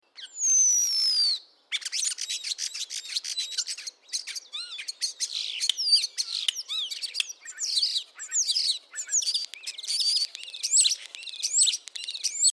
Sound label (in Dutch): vogel